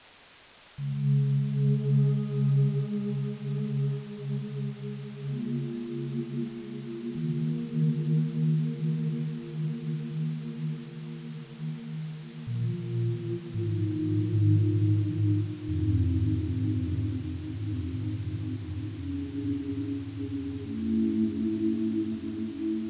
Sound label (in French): son peur; de enfer2k